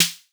snare classic; snares

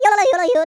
YODEL; ILIO; Vocal Planet

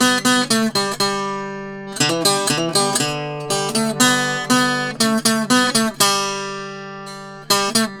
Tenor Guitar, Appalachian

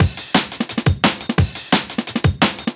DNB BREAK

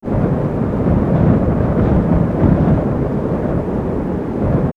Nature Cold Wind; X-Static Goldmine

